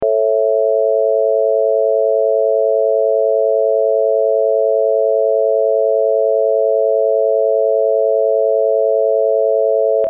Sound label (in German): Dur-Dreiklang